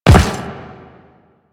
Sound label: Step Rock, Footsteps; Warframe; The New War; Kahl; Quests